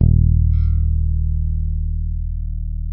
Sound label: bass, sustain; Sampleheads; Will Lee Bass Library